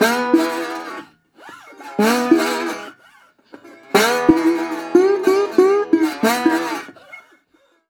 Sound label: 120, Blues Guitar; ILIO - Fretworks